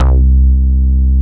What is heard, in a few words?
HOLLOW BASS; Masterbits; Dance Trax